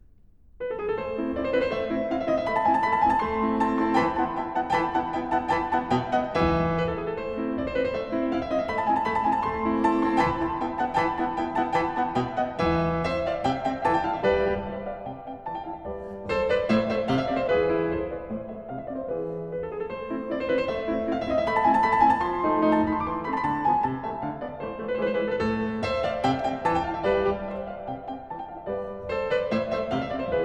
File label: Piano Sonata 11 In A Rondo Alla Turca; Mozart; K 331